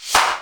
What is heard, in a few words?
XD5 Snare, Kawai Drums